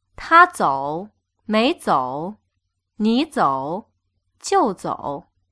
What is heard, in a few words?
tones